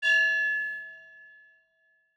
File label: ring; Kayne Ruse; Unity; Alternate Genre Jam